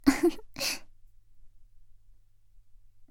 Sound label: laugh, ASMR; Garden; WithRin-Nazo01